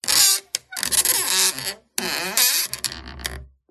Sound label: Stress Creak Squeak Groan; PMSFX